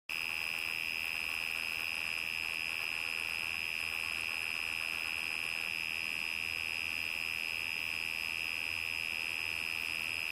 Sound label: mouse, Listen to Your Electronics